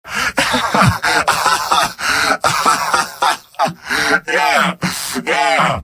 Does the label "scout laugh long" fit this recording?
Yes